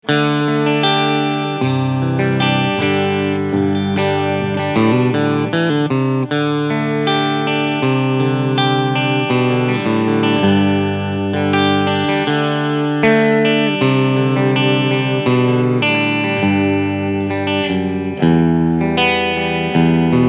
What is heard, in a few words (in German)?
Squier Tele Clean Sound beide PUs; 40th Anniv